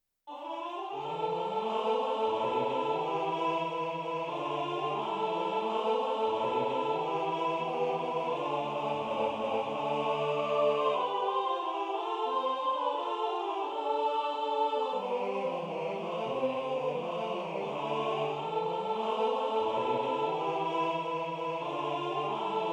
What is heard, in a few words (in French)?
tutti, chants